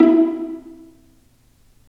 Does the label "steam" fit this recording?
No